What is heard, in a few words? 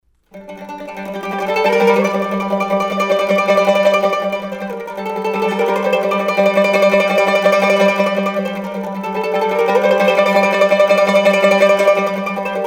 Reve Oriental, mandolin